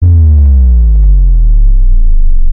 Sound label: explosion